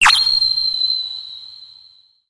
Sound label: eye shine; easyrpg; WitchsHeart